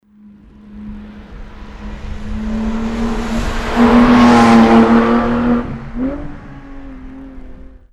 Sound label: drive; porsche officially launches a road going rsr with its 911 gt3 rs 4.0